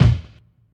mid range kick single hit b key

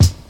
00s clicky hip hop kick drum single shot f key

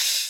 old school light open hi hat d sharp key

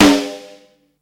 roomy snare sample g key

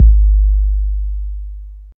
subby kick drum g sharp key

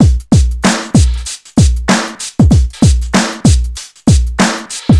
96 bpm breakbeat sample b key

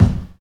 dope bass drum sound d sharp key; kick drum samples

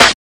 airy snare drum sound a sharp key

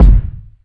warm sounding kick drum single hit b key; tuned drums

